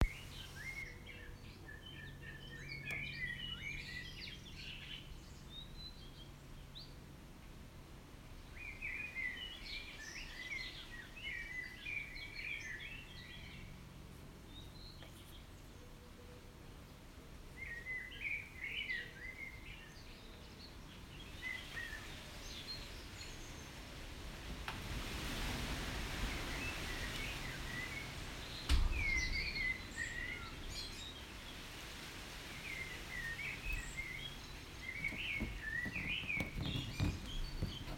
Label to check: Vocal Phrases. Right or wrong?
Wrong